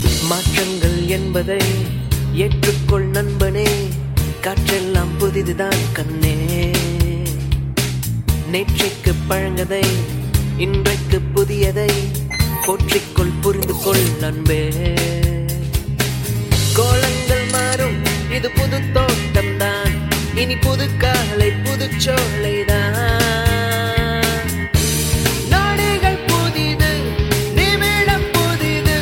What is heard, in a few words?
Goa Bit Song